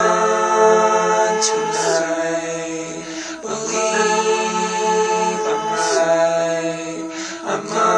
believe im right vocals, Folksy Guitar and Voice Stems